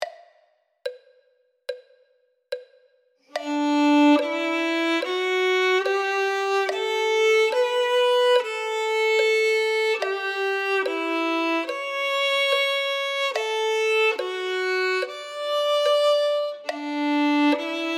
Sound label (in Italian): violino I